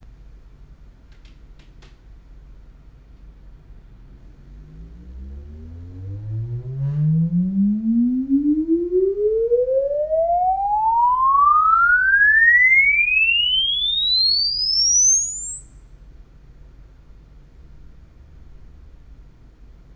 ESS recorded

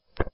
sweep 5s 500 5kHz; Hilbert; Mic1 tubetto; CESI; LaSpezia

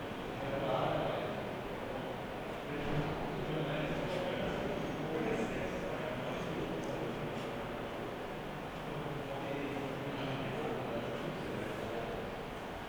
Background Noise; Nevaton

